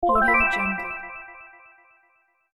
soft retro 90s video game start sound

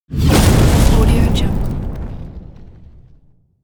fire explosion

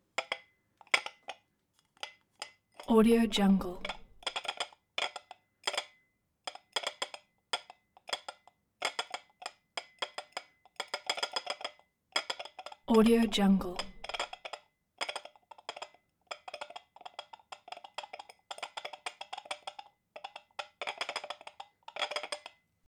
efx int tea cup rattle; collanderson

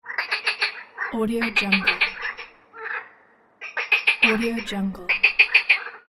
frog

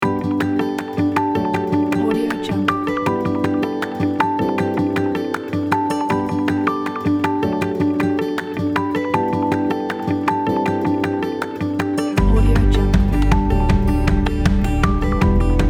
acoustic background motivational kit

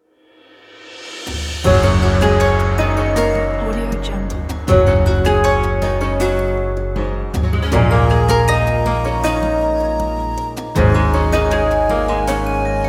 japanese intro; audioagent

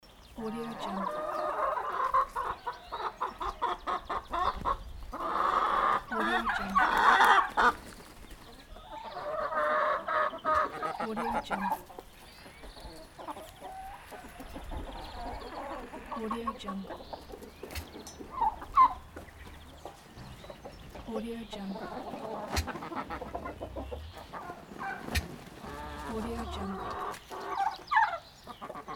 hens clucking in henhouse

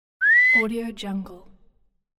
whistle

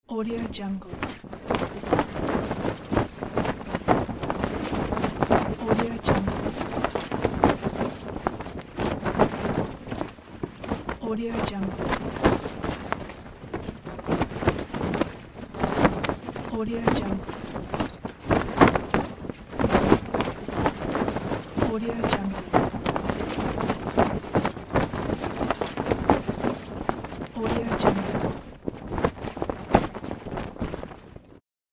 flag flapping

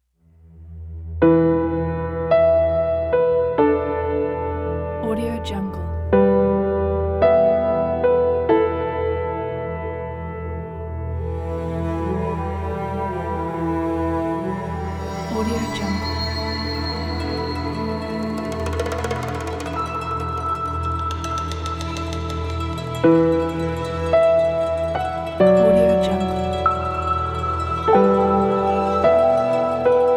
country western cinematic trailer

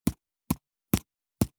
handwriting fountain pen

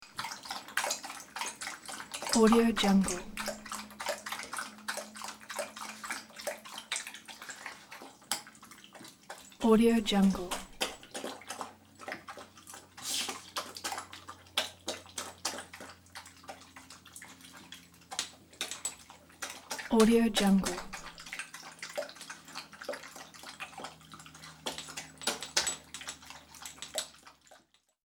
dog drinking water

